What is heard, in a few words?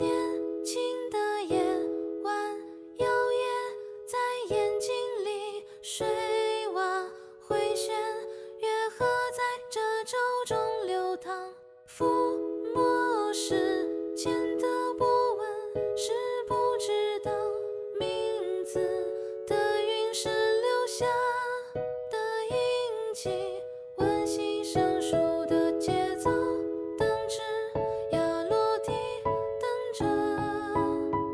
dual vocal